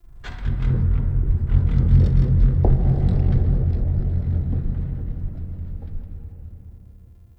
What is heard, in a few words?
Root Cave Low Creaks; Ambience; Neathuns Root Cave; One Offs